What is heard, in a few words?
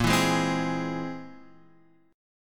C Augmented 7th Bb